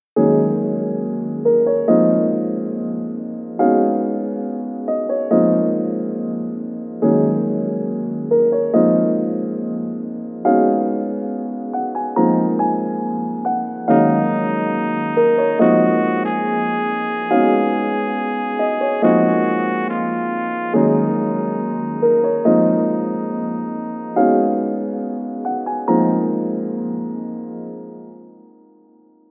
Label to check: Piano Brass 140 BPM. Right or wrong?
Right